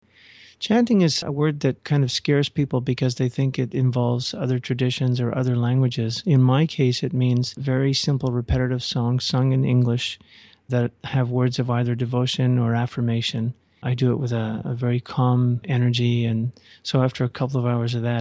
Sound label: chanting